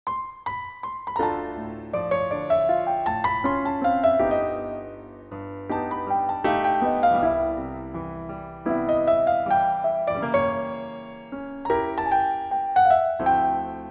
Cocktail Lounge; Sound Ideas; Mix V

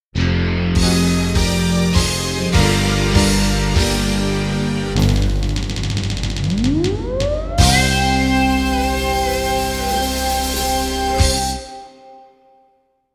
Fanfare; Network Production Music; NM101 Ain't No Stoppin' Us (Multimedia)

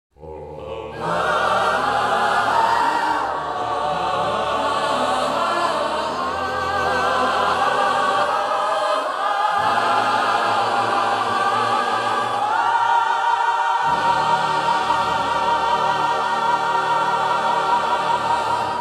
Fanfare; Mellodrama; Network Production Music 082 Vocal Stylings (Specialty)

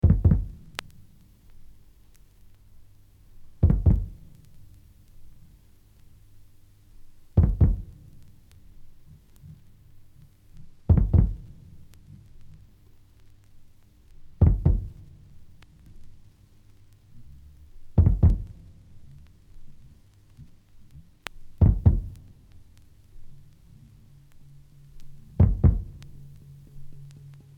African Suspense; KPM 1000 series

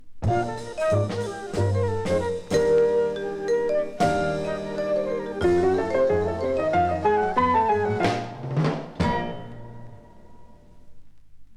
Modern Jazz Septet; KPM 1000 series

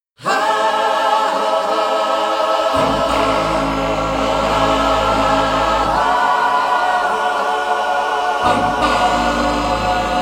Majesty Fanfare; Network Production Music

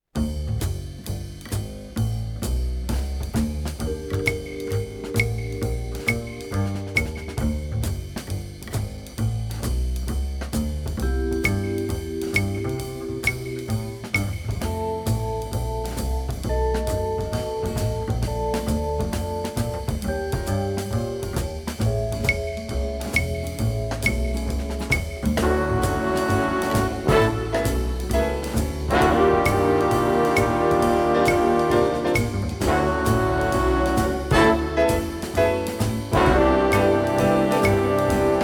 Velvet Brass; Velvet Brass III; KPM 1000 series; Flamboyant Themes Vol.2

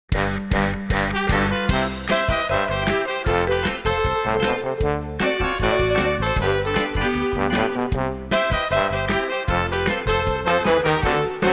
Mexican Brass; Network Production Music

